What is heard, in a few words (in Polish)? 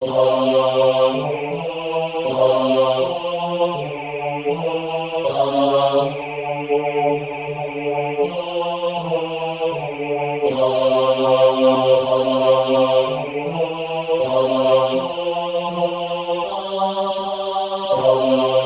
Bas solo